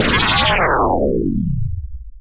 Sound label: slowdown